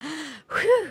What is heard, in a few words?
surprised; emotes